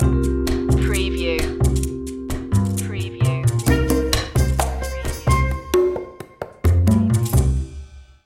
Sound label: jazzy opener